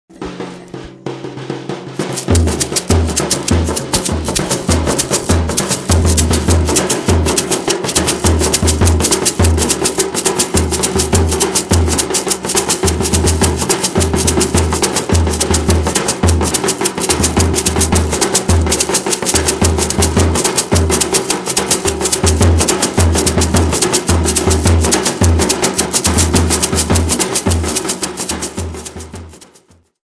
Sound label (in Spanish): cocos instrumentos todos